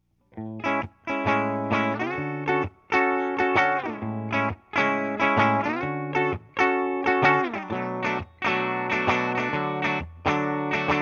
sm57 vs 906 vs rode

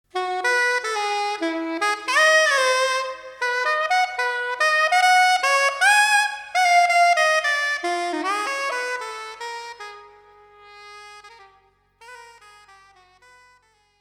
reverb post fader

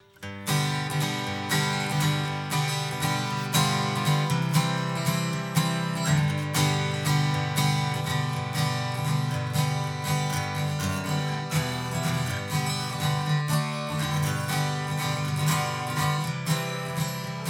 delay guitar

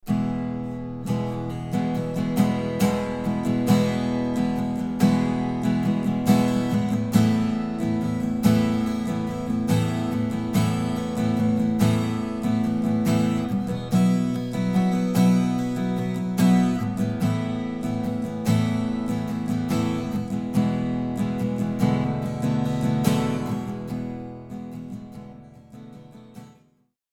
small hall; audio examples from the book

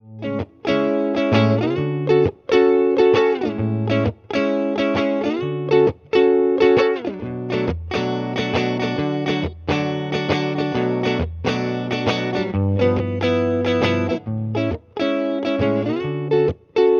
electric yuck boost, Electric guitar 480Hz yuck; Mixing for God audio examples

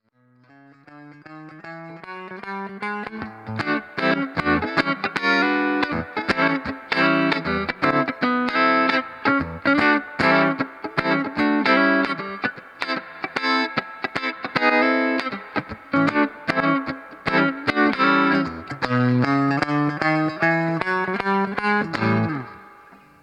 distortion, Distorted sound; Mixing for God audio examples

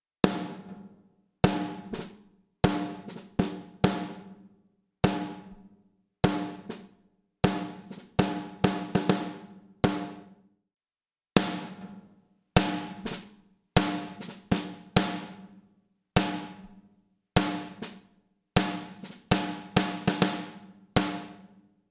snare EQ out in, Snare drum—EQ out, then in; Mixing for God audio examples

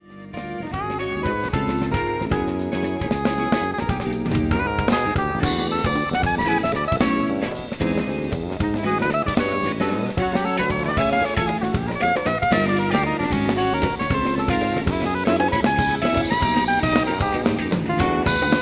refined adding fx; audio examples from the book

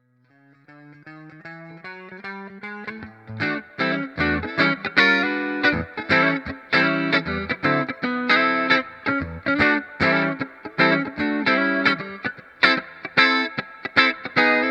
flange

